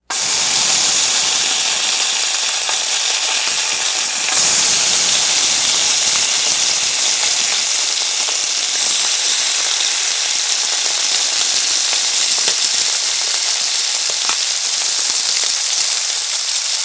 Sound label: bacon frying; food; sound effects; sound effects one